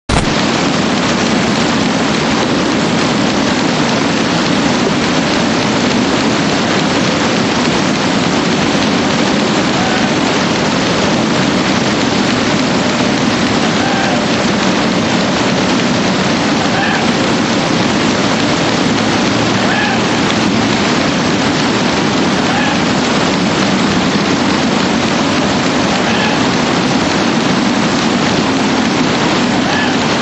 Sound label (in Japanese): フクロウ♀ 少年の森